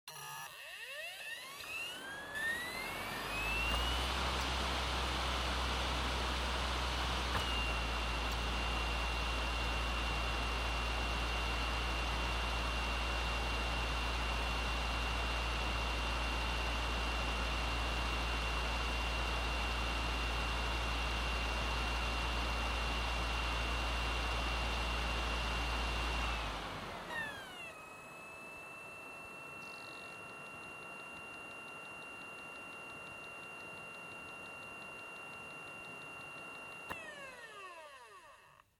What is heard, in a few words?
Optical Disk Drive Operating Noise